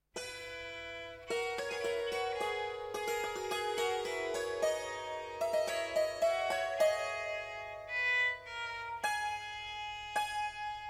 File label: Dulcimer